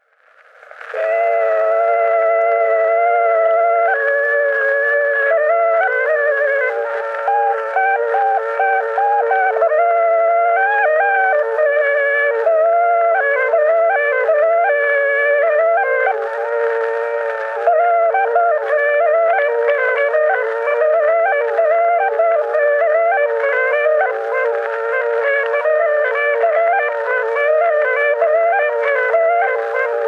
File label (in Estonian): Torupilli Jussi lugu, Emmaste, torupill, folk